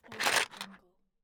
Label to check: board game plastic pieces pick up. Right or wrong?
Right